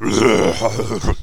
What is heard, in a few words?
death; player